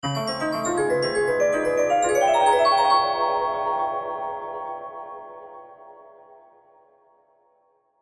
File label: Crescendo Piano Glockenspiel Eerie; Musical Sound Design; Blastwave